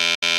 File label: shelter computer error; Original TK Sounds